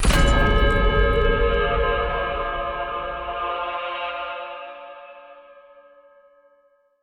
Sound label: iron defense